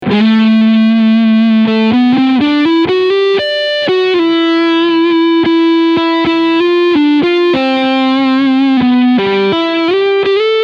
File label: Epiphone Les Paul 1960 Front PU Overdrive; Bonamassa LTD